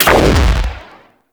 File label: Pistol Explosive Impact; Warframe; Weapons; Sapient Pistol